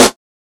00s shiny snare single hit d sharp key, snare sound tuned to the D# note; snare drum samples